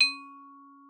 XYLS C#4, VIBES; Xsample VOL-4

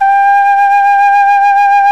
KENA G2; World Combo

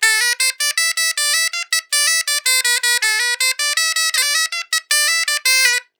BOMBAR, Celtic Flavours; USB Soundscan vol.42; AKAI

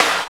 SNR BHANGR, Snares; AMG; Now CD-ROM (Roland); NOW! Drums

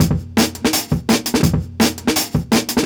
168, Jungle Breaks; Original Jungle Breaks

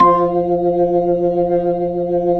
B-3, Jazz

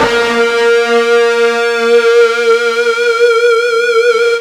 FUZZ A#2, ULTRA FUZZ, Guitar; East West; Steve Stevens Guitar Samples Collection

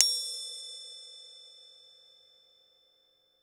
CYMB; Houseworx; Drums; Cymbal

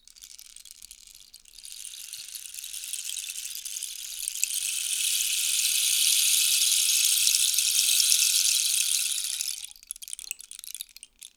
RAINSTIK; PlatinumPhattCD2; E-MU Producer Series Vol. 8 – Platinum Phatt; Flexis Etc

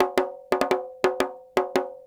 Petia, World Percussion

